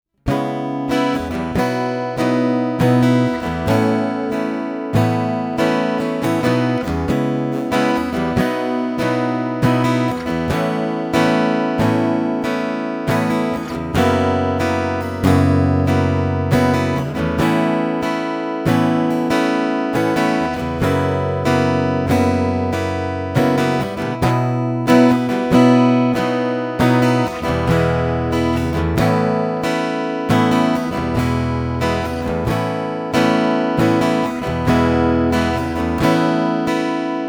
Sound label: ibanez aam700ce nt strumming undersaddle pickup